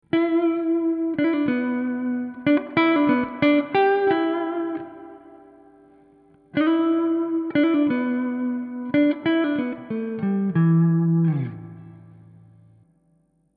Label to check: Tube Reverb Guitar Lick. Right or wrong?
Right